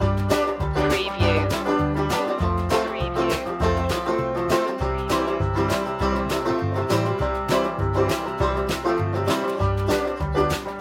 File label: happy western loop